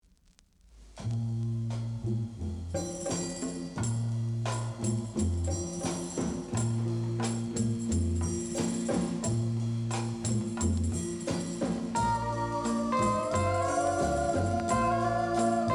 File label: piano